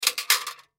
Metal Handle Move, Foley Metal Handle Movement Tool Box; Metal Doors; Blastwave